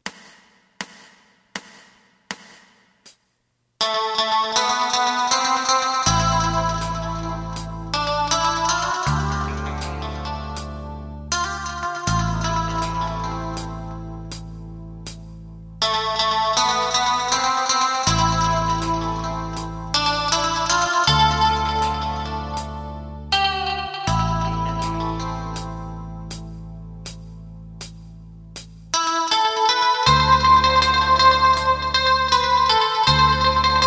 guitar full

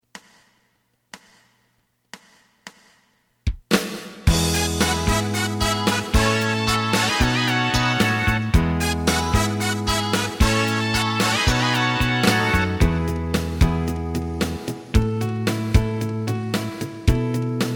lead

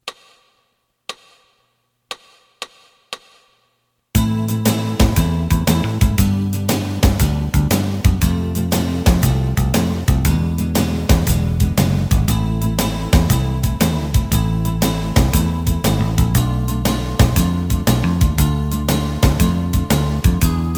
Am lead

